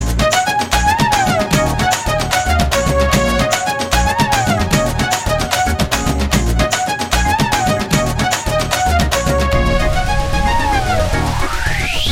BGM Instrumental Beat Ringtone

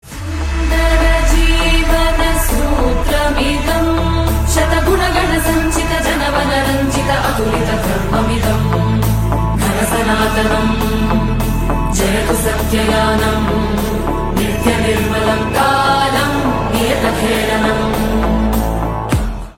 BG Chorus Ringtone; Akhanda 2 Haindhavam

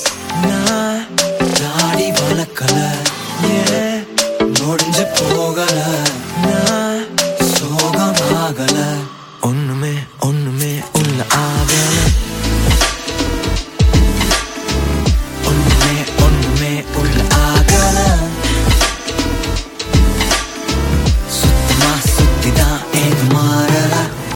Male Ringtone

